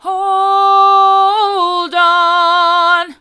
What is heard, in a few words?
hold on hard, vocal